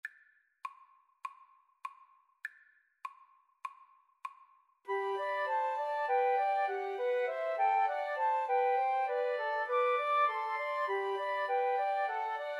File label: Flute Trio